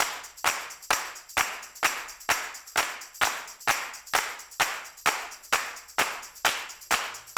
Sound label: Percussion